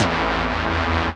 Fm Dub Chord

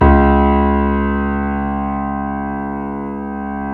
YC7 piano, pp; Roland L-CD701; pp YC7 St

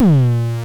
FM Kicks Distortion A#2; Drums; LookIMadeAThing Kicks - Vermona Kick Lancet

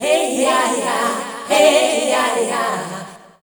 FEMALE CHOIR, Dance Vocals

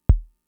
Kick, Drum Machines Fricke MFB-522

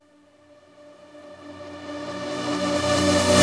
Reverse Synths Bmin; Effects; Vengeance; Essential Clubsounds Vol.4

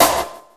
SD, Drum & Bass, 150 BPM

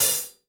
Gretsch Hi-Hat Open; Sonic Foundry (Sony Creative Software); Vital Drums

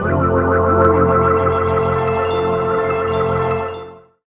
Bellz, Pads; Jungle Warfare 1&2

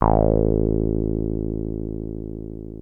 303 A1, TB 303, Synthesizer; Hollywood Edge; Giorgio Moroder Rare Synthesizer Collection

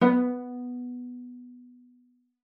cello pizz B3; 90 s Sample CDs; Xsample VOL-5

